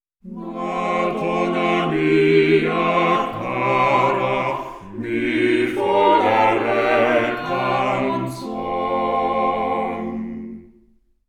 quartet

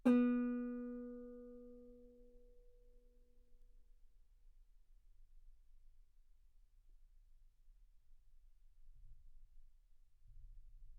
Guitar ff B3; sul B

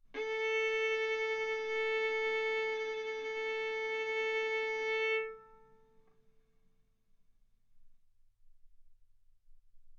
Violin arco A4 B4; mf; stereo

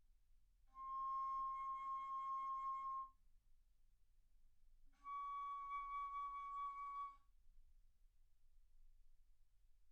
Bass Flute C6 Db6

